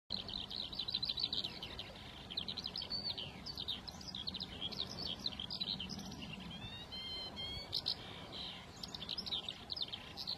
canto da laverca

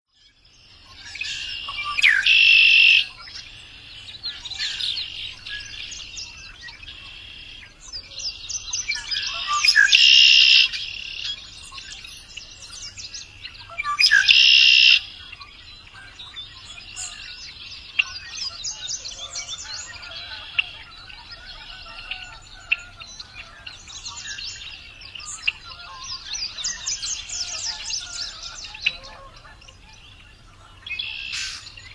Canto do sabia sargento

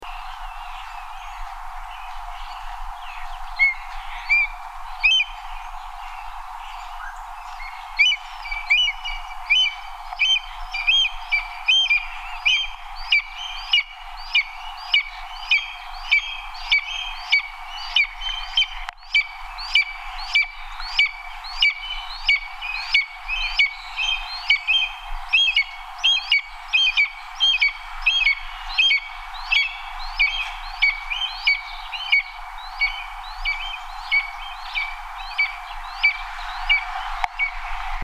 canto do gaviao papa gafanhoto

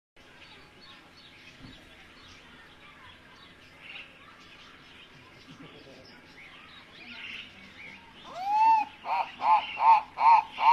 canto do turaco de crista branca

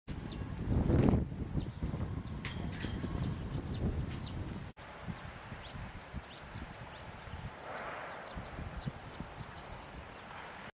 Canto da escrevedeira de pallas

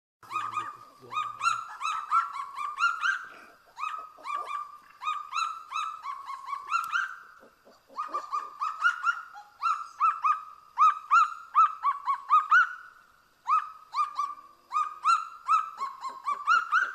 canto do kagu da planicie

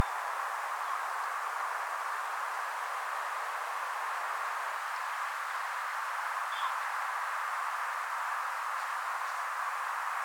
Canto do Abetouro

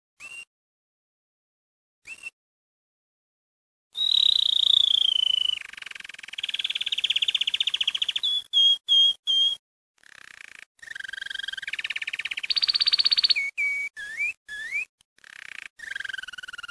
Canto do Canário Belga

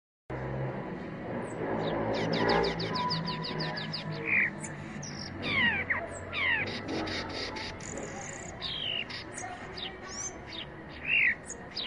canto do gaturamo do norte

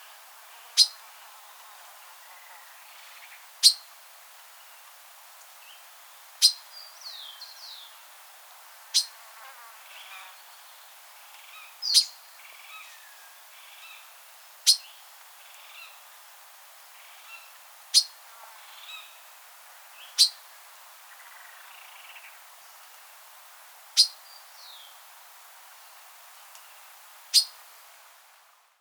Conto da Pipira Vermelha

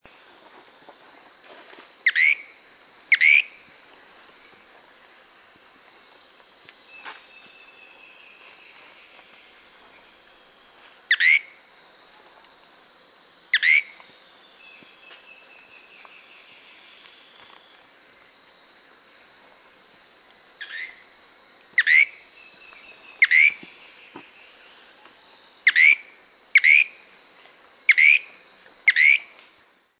canto da cabeca de prata

